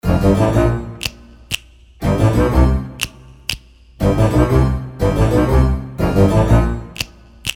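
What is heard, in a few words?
short melody for ringtone; iz semejki addams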